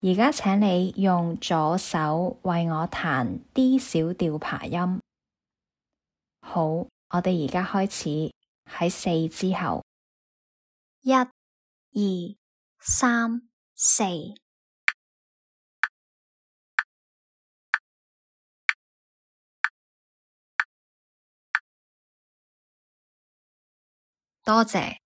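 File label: D minor arpeggio left hand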